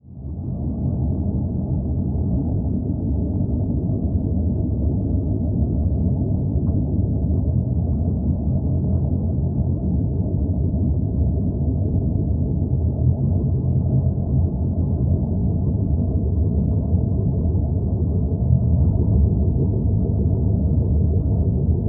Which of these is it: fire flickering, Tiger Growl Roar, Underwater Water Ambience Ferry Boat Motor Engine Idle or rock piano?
Underwater Water Ambience Ferry Boat Motor Engine Idle